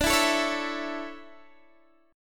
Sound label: D Major 7th Suspended 2nd, D, down, Guitar Open D